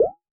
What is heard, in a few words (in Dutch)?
Ploep